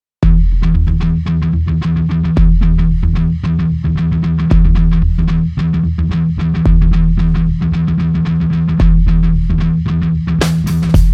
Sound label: Guitarmony